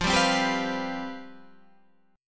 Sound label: C Major 7th Suspended 4th, F, guitar chord